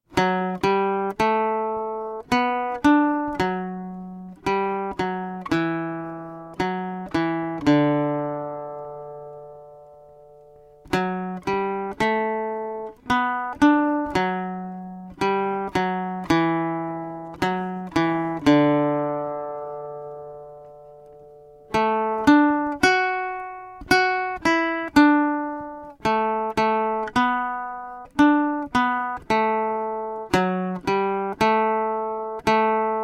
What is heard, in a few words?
baritone uke